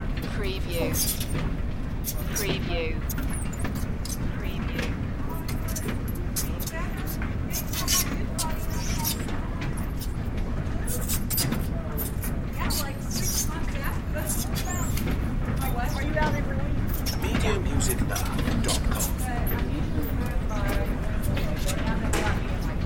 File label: Airport Baggage Carousel, Light Crowd Walla, Taxi Driver